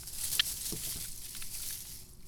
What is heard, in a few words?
Crinkle Plastic; BB Retail Therapy Sample Pack